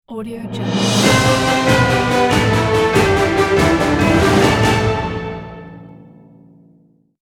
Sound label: dramatic orchestral breaking news jingle